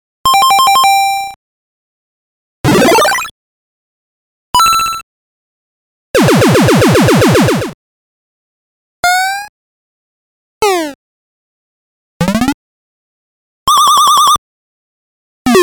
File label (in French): bruitage mario; NES; Mario Bros; capture des bruitages